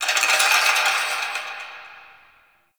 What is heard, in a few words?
Chain Rattle Distant, Crypt Ambience; One Offs; Champion Crypt